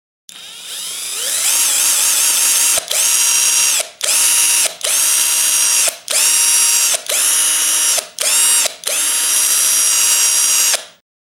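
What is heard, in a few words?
sound of screwdriver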